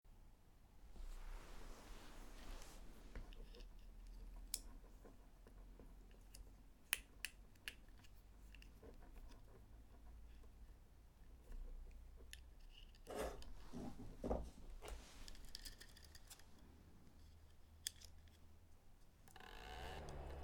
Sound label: Fan Noise